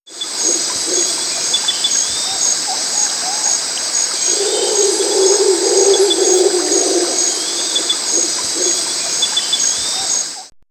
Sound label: Nature, In D Jungle; X-Static Goldmine